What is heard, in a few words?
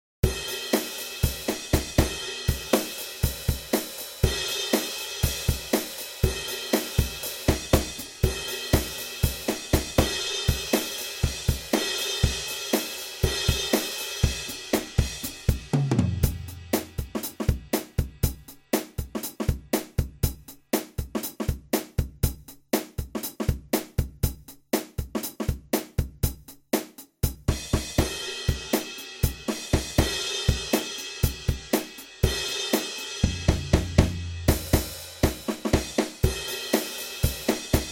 drum